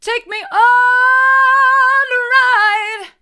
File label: take me on a ride hard, vocal